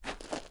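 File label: shuffle, footsteps hard surface jump up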